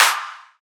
Clap

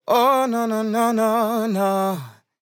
Ad Lib Dry, 95 BPM F Maj, Vocal; Cymatics; Euphoria Vocal Sample Pack; Ad Libs

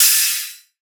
Ride, Techno Drum One-Shots

